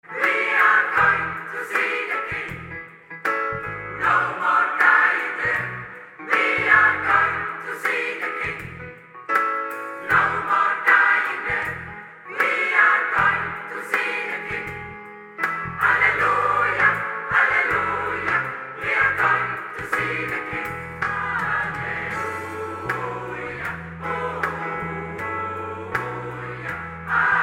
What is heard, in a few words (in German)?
chor verfaerbt; createc